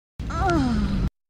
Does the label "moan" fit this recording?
Yes